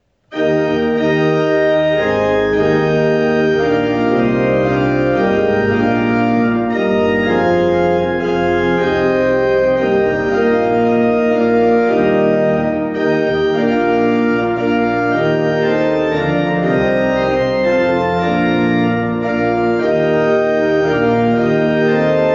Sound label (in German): Macht hoch die Tuer Orgel